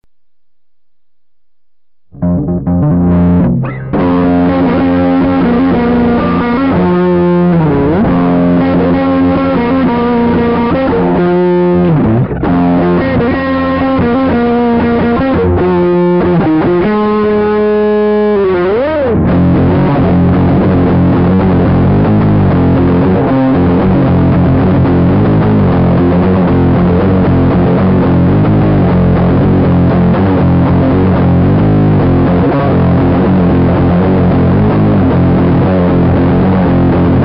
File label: drop C